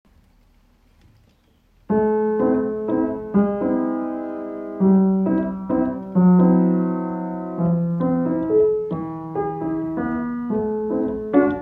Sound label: Jazz Waltz